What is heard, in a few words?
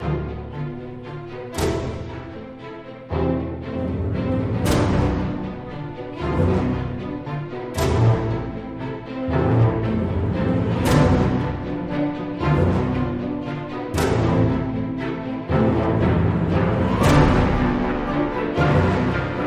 Epic